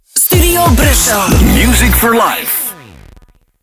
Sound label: Key Sweep B 91bpm; StuBru; Music For Life